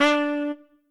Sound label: Trumpet melo